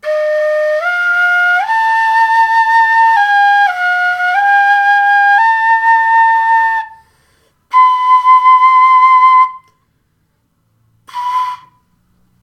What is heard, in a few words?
flauta c